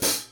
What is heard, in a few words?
hat